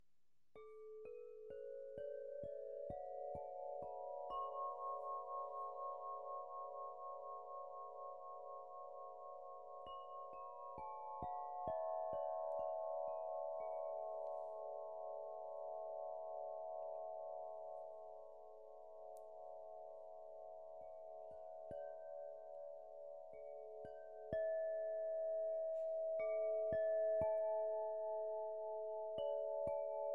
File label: Heavenly Sun A 432hz, Crystal Harp; Crystal-Harp-Ethereal-Wings; Harfa krysztalowa Niebianskie Slonce A